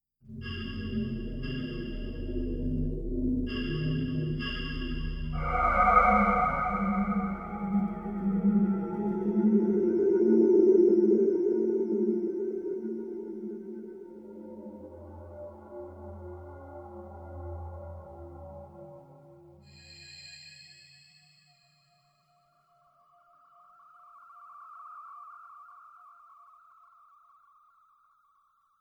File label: space noise